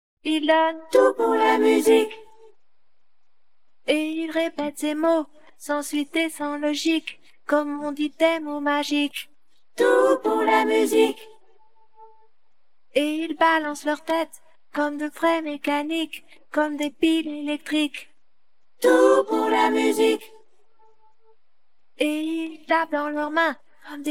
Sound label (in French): chant; demixificateur